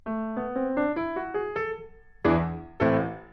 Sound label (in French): mineur